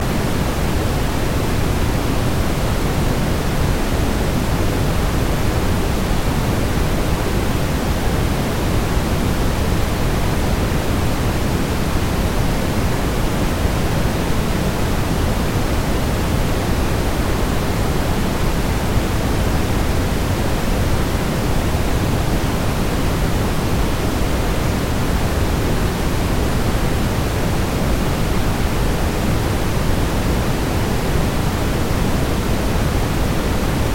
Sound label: Pink Noise